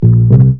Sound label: BASS; SoundFactory